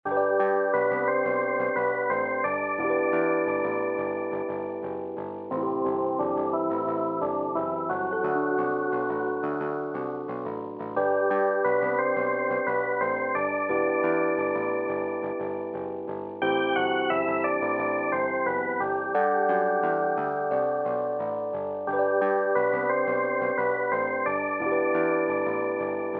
Noise Channel; CYOP; Gerome's Side Project; Fly High Elevator (Noise Channel C)

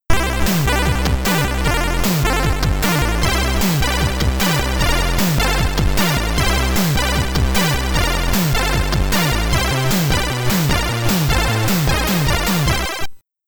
Stage Clear; qix commodore 64

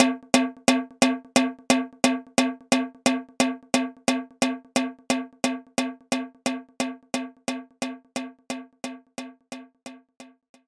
Brushed Tom

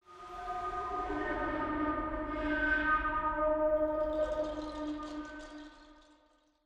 Woosh; From Dust; PS3; Xbox 360

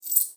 Coins

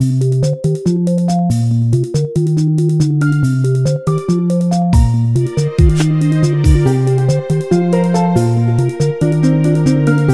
Menu; super meat boy 2015 ps vita

